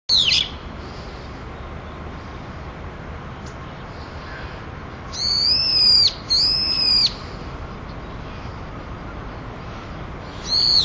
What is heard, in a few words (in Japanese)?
hiyodori